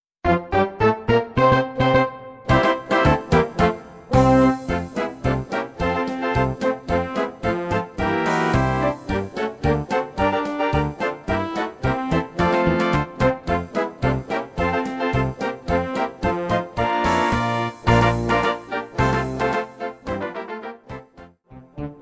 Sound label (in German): Marsch